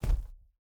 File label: bear footstep dirt hard; Rust